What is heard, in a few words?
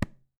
TABLA SLAP; AKAI S6000; BANYA; WorldSounds